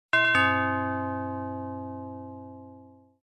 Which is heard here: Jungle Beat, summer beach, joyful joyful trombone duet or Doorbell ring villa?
Doorbell ring villa